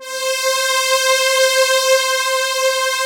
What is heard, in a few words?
STR SYN, SYN STRGS